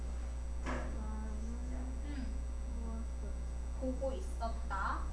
correct

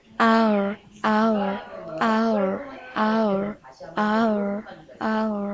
owl

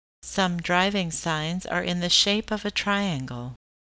triangle

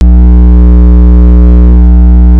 frog

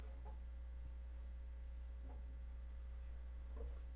bowl

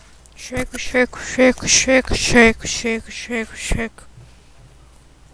shake

